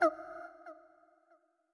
Toxic Mask Hurt

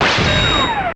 Coin; Raiden Project; PS1; PSX; Raiden II

